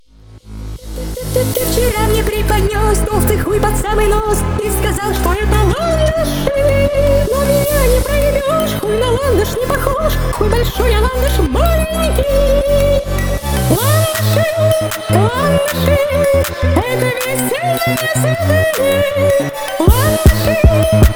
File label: Phonk Remix, Sped Up